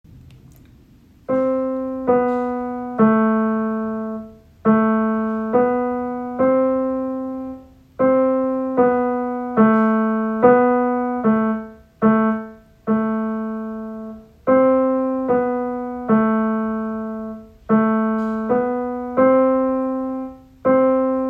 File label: Night Owl, Home Recorded, student recordings